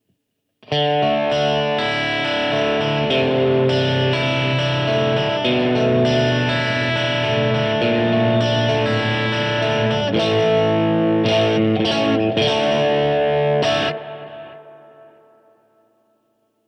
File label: overdrive; ToneMasterPro; Blues Senior